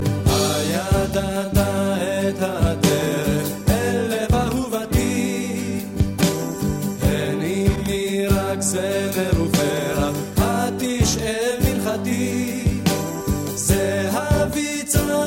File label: METAL